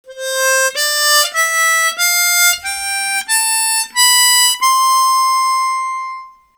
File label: MINOR HARMONICA; Seydel